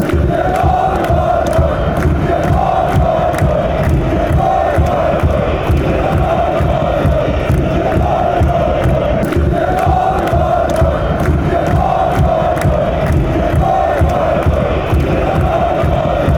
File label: turkey goal fanchants